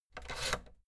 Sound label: fader plastic scrape double down fast